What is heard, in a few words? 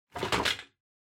Vacuum Cleaner Stand Pick Up; 掃除機; スタンド 持ち上げる